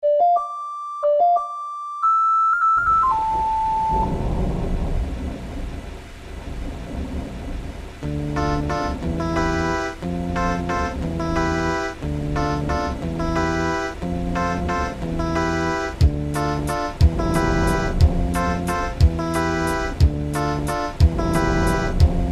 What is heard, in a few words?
Waltz